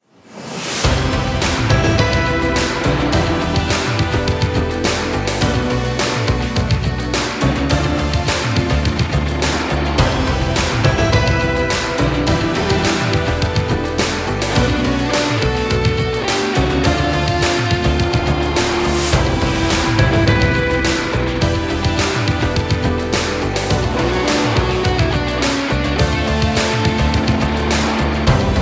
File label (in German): Telefonjingle; corporate sound; Zeppelin